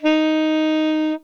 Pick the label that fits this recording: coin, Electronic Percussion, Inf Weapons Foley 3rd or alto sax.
alto sax